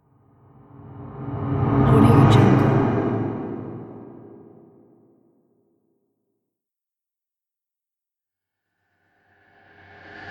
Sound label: space ship pass by